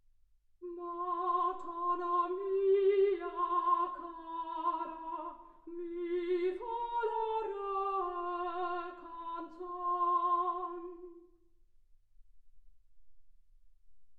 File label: soprano